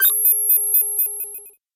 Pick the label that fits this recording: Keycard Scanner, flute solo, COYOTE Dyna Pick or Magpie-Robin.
Keycard Scanner